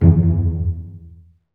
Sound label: STR SEC, STRINGS; Roland - LA Composer vol. 1